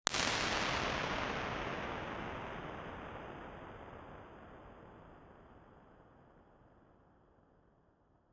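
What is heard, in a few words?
stereo, impulse response, St Marys abbey reconstruction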